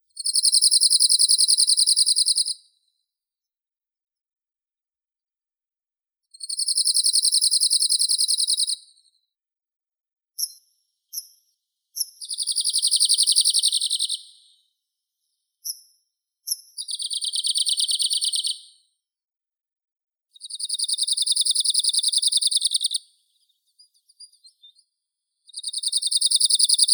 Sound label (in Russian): penochka talovka phylloscopus borealis; zvuki prirodi